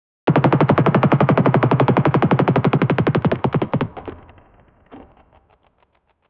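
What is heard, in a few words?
instrumental, laser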